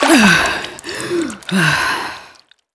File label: gasp